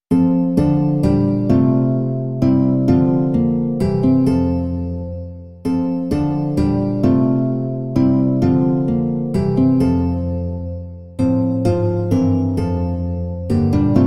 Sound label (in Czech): kytara a harfa; Ve všem jsi ty; Imaginativní verze